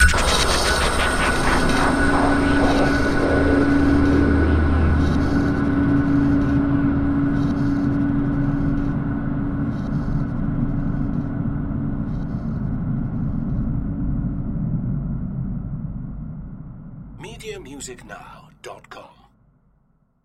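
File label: Production Element Hit Spinner; Sound Effects Bible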